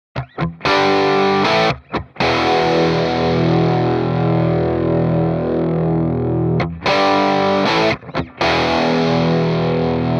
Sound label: Coyote mid emph; mojave